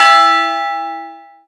timer end; Birthday Party Bash; Wii; Spanish

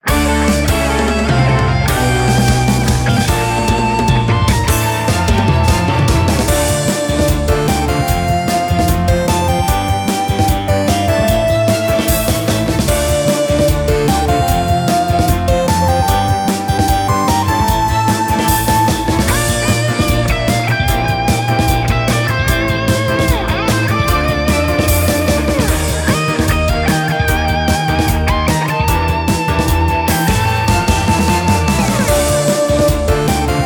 Music Event

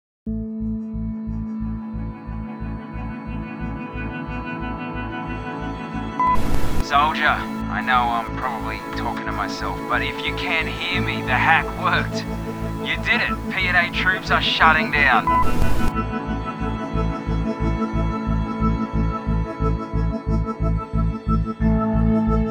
Ending Music; Mech Skeleton

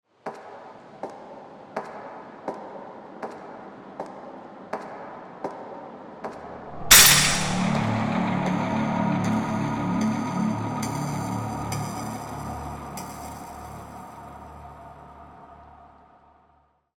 Collapse; Escaped Chasm

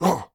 Grunt; blastmorph pinball; xbox series xs

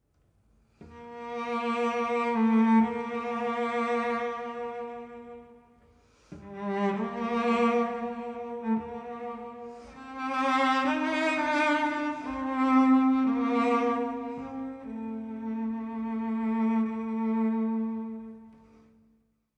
Savannah Music

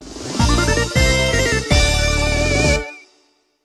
Jingle (Clear, Win); bomberman panic bomber; psp

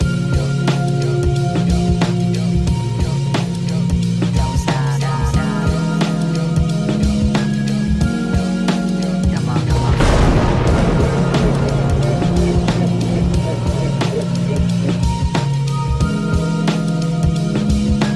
Game Over; Spooky Kids

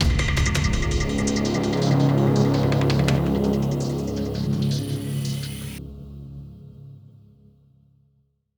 Dramatic Mood

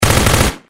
sound (tommygun); Necronomicon Book of Dead Names

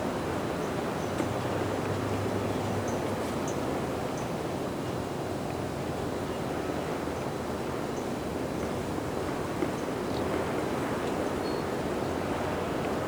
Starter Wind; Kaos

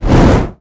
torch; sports room sfx; birthday party bash; wii

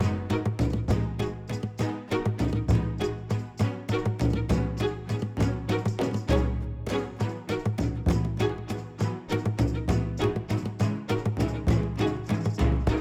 Music Ingame Huntersguild Loop

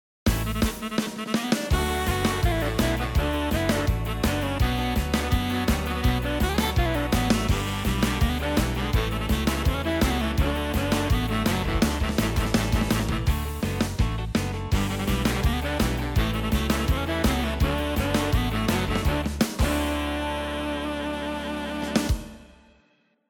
Old Rock Samp; Kaos

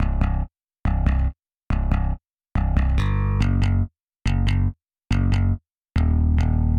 Drums and Jingles Explore Bass; Metal Tales Fury of the Guitar Gods

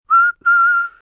Create Whistle

